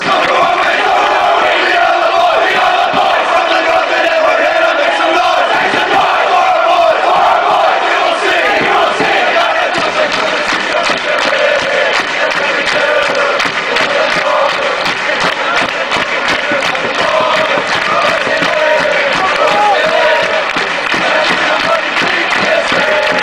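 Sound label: noise, football fan chants; north end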